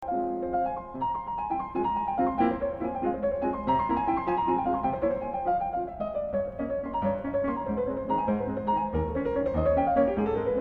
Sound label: Waltz in Ab Major